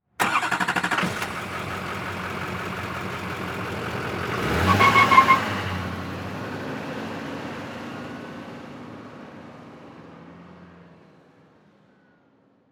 Transportation Truck Diesel Truck Drive Off Peel Out